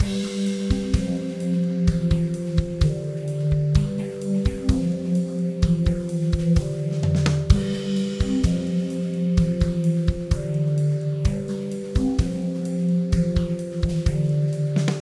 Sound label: bonus loop